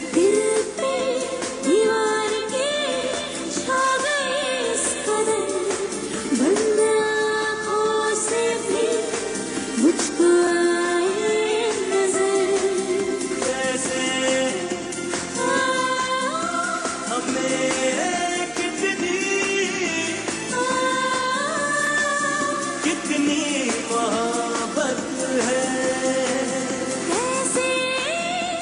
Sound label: Rock Mix